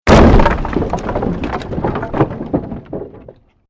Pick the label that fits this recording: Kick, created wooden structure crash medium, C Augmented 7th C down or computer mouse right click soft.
created wooden structure crash medium